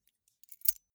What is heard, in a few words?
key ring small place soft